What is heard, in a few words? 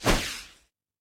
Paper plane Used; Power Paperplane; hello kitty friends match; android